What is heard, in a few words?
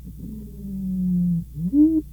Potnia, treehoppers; Cocroft